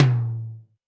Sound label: Tom 12inch Stark, Alesis D4